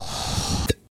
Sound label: slurp plop